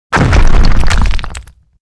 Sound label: boss gate die